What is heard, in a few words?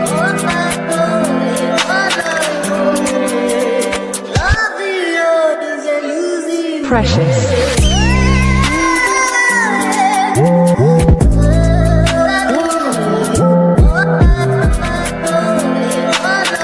Drill Refix